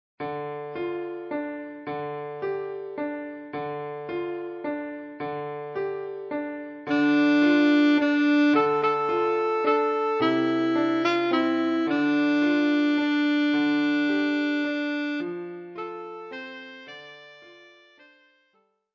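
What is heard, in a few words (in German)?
Scarborough Fair, Altsaxophon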